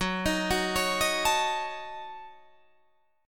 Gb Minor 6th Gb Guitar Drop D

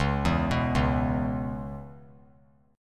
Db Minor 7th Db down, chord; arpeggiated; JGuitar

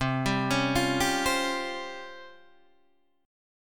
Db Major 7th Flat 5th C

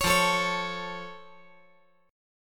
F Augmented F

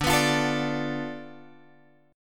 D Minor 6th Guitar Open F; Dm6 strummed

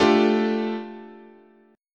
A 7th Suspended 4th Sharp 5th, guitar chord

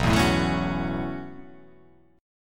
B 9th Suspended 4th B down

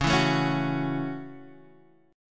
A 6th C sharp

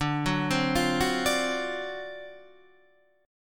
D 7th Sharp 9th D, chord

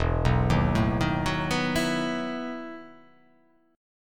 E Major 7th Suspended 2nd Suspended 4th

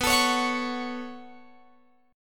B 7th Suspended 2nd, Guitar, Open F; B7sus2; strummed

